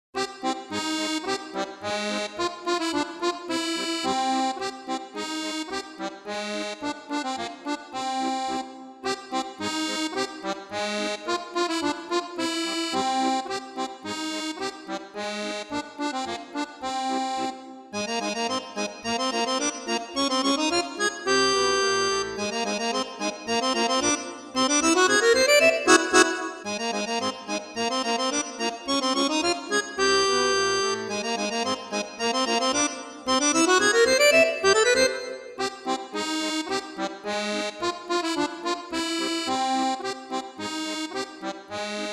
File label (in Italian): Solo; Saltimbanco (Accordion solo); mini suite